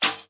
archer attack; propack

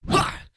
daemon attack; propack

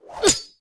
swordman attack; propack

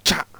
axe thrower attack; propack

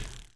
arrow hit; propack

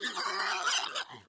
pig die; propack

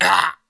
worker die; propack

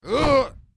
guard attack; propack

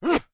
horseman attack; propack

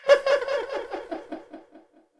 tower attack; propack

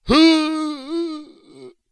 guard die; propack

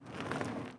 catapult walk; propack

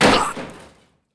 catapult die; propack

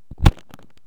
stickfighter attack; propack

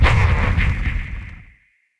tower hit; propack

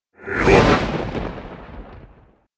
archmage attack; propack

